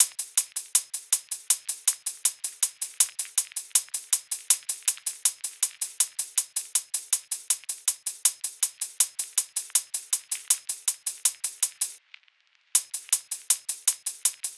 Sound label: hats 80, Hat Loops; drum sample pack; Fantale; SEISMIC; Slate